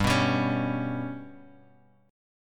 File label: G Major Flat 5th Bass Standard, chord; GMb5 strummed; JGuitar